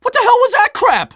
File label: scout jeers